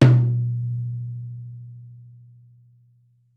Folk Tom

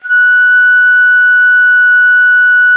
VENU F#5, Old World Instruments, World Winds; E-MU Producer Series Vol. 7 – Old World Instruments; World Combos

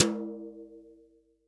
TOM, Real Drum Kit, SWING KIT; Best Service ProSamples vol.43 - Real Drum Kits

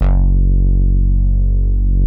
Prophet 5 G2; Hollywood Edge - Giorgio Moroder Rare Synthesizer Collection

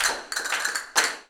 Castanets, Flamenco

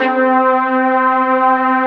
FILM SCORE; Greytsounds; Scott Peers Sound Engineering

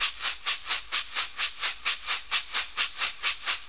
Shaker, Perc Loops; Drum Loops; 4-4; Sonic Foundry; Drum Tools

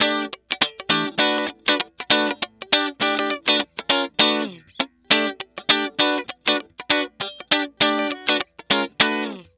Electric Guitar; Sonic Foundry (Sony Creative Software); Mac Money; Guitars

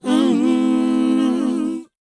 WHOA G, Vocal R&B & Dance; ILIO; Vocal Planet

